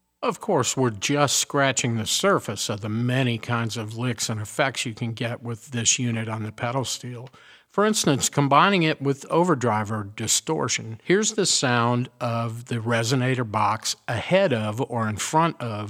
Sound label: resonator pedal, steel guitar